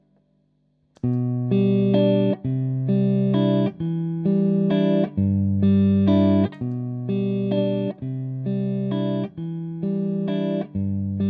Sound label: Loop